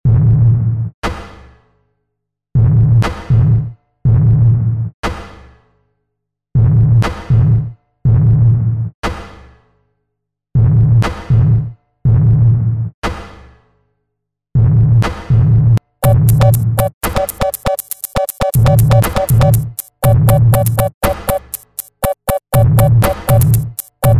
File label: Sine Wave